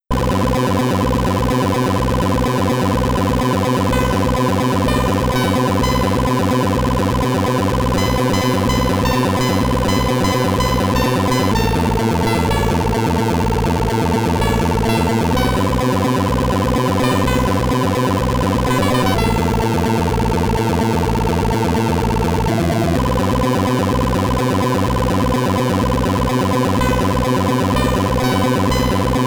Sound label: Stage SID Stereo; Gemini Wing; Commodore 64